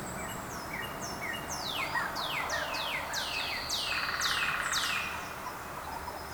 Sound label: march bird chorus